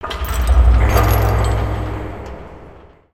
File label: distant objects rattling; ninjago